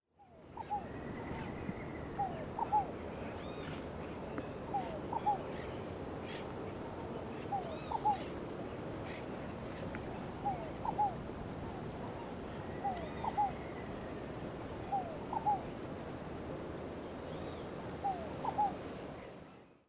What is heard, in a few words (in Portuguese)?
Fogo-apagou Columbina squammata; Jacauna